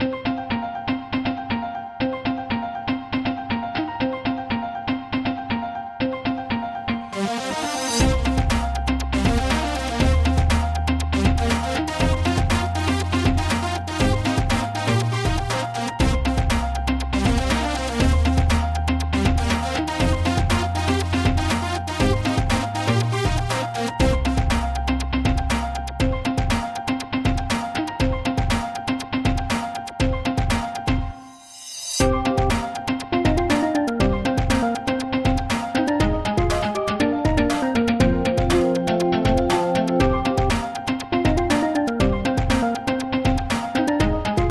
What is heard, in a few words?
tower Boss